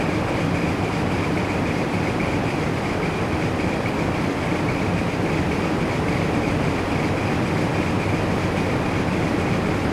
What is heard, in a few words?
ambience fan